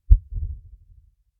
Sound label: Low Boom